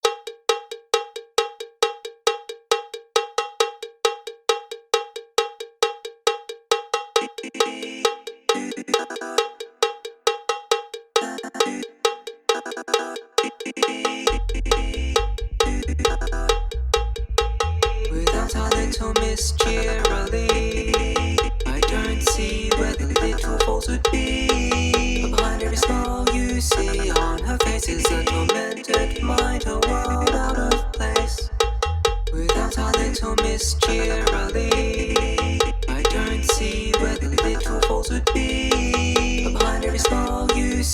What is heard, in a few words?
Electro cowbell remax